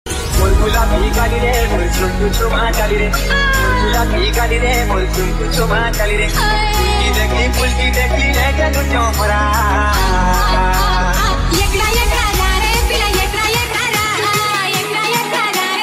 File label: Odia DJ Ringtone